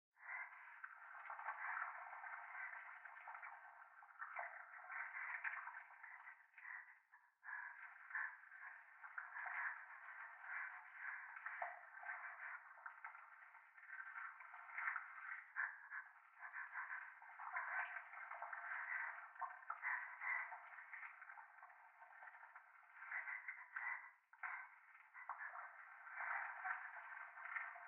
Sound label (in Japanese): トイレ 右耳快感吐息 触手